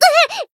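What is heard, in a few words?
Voice damaged, Japanese; tiramisu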